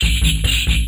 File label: drum loops; bb drum loops